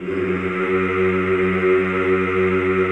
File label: CHO F#1, Aaahh Yhh; Sound & Vision - Gigapack I CD 2 (Roland)